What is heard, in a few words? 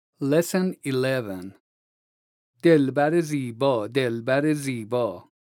vocalization, Daf